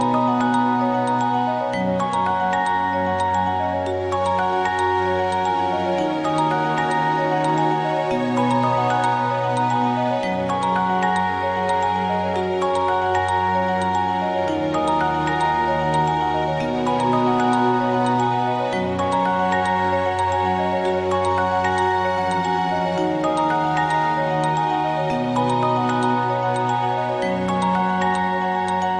Twinkle

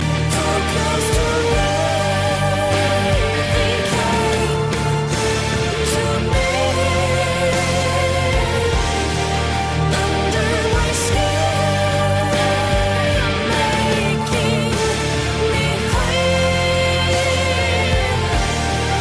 Breath

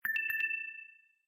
Low Battery; Optimus

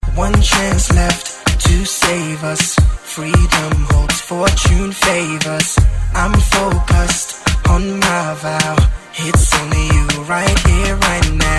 Rnb Cool

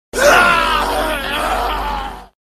Death Sound; Ark

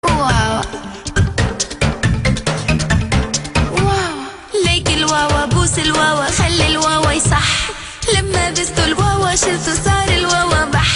Arabic Ringtone

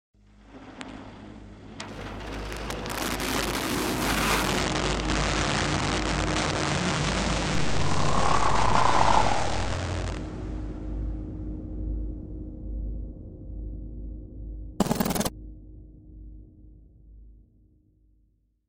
Haunted Phone

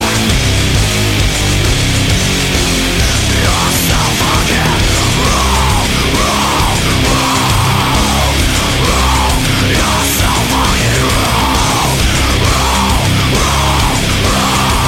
Wrong

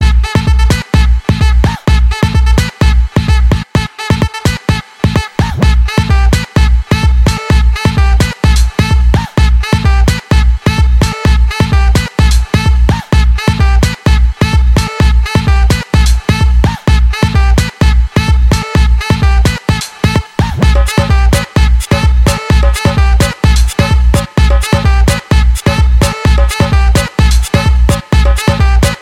Saxo

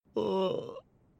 yawn

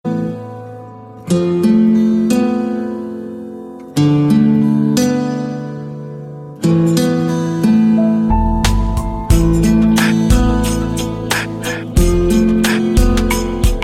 Piano Lovely

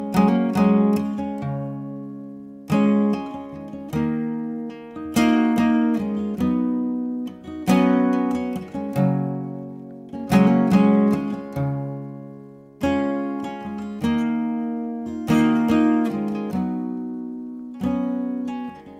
Guitar Call

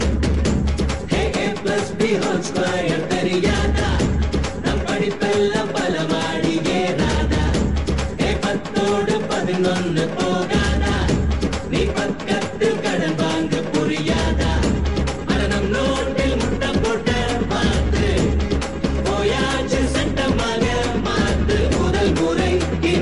Title Song

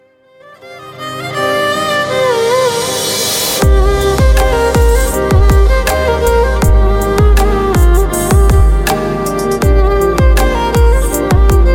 Violin

